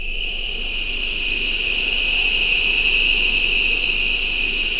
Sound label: E NOISE BED, VOCAL WIND; ILIO; Vocal Planet; Jazz & FX